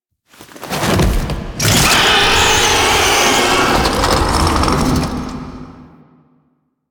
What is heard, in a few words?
Finisher Death; Warframe; The New War; Archon; Boreal